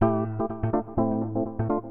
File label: SYNTH HOUSE 125 A; eJay; Keys